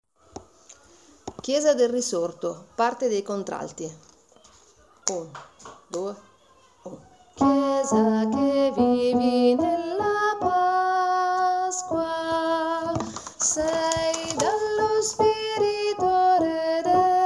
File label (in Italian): contralti